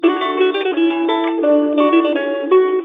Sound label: logo mellow